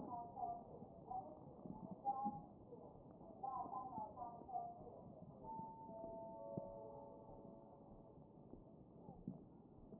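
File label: anterior chest, position 1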